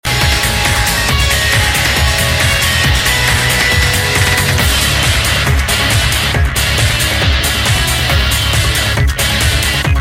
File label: Instrumental